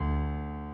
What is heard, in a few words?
key; keySounds